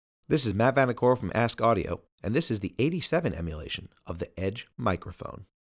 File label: Vocal